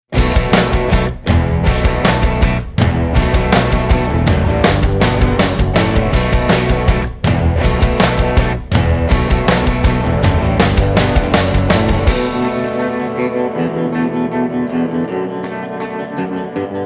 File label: Radiophonic